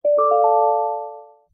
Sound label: Level Up